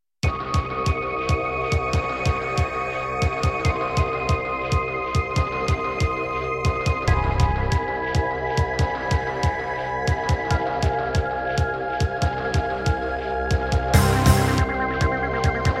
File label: Roland SD-90; GSMODE; sigil doom episode 5